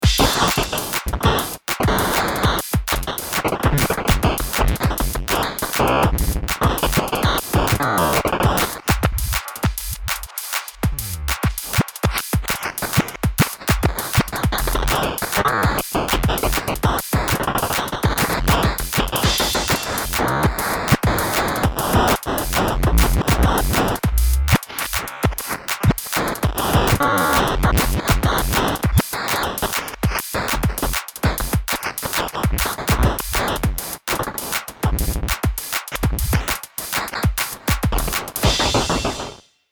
hologram electronics microcosm midisync drumloop interrupt